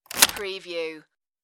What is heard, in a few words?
car key insert and pull